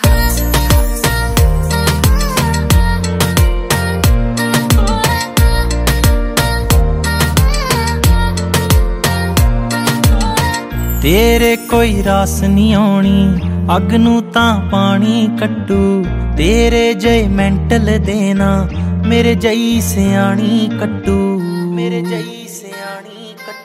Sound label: bollywood punjabi ringtone